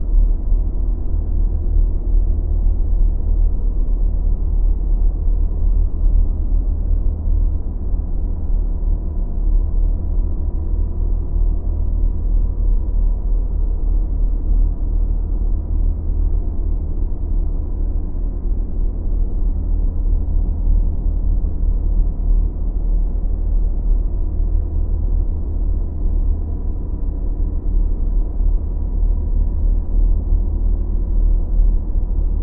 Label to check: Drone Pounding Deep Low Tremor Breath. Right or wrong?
Right